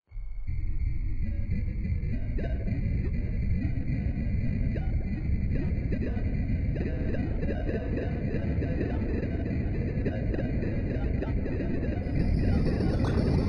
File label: synth test